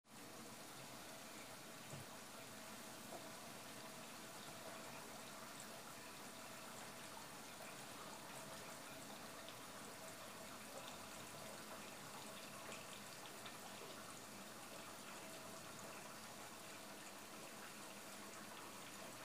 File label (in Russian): zvuk stiralnoj mashiny